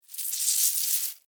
Insect Hiss Angry, Animal, Insect, Hiss, Angry, Bug; Insect Voice